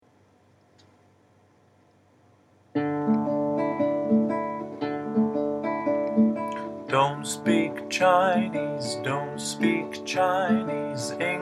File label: song; the speak english please song in the english room